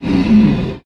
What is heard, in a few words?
Omega Blue Recharge